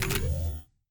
remove module

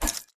points attack

